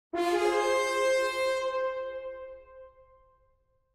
Cutscene Positive Sting; Nanook

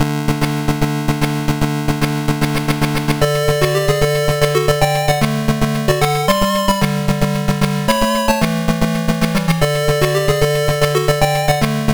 All Clear; Sagaia; Master System

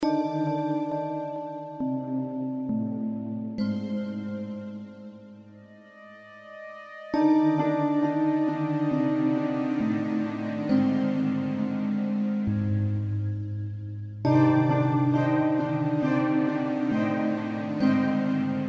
Sanctuary Tension; overlord ii

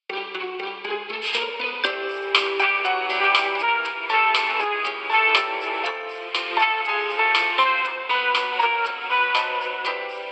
Elevator

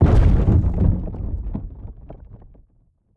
farmhouse shake thump

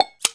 Item Add To Belt; pangya fantasy golf pc

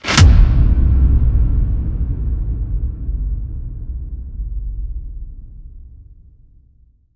Map Event Attention

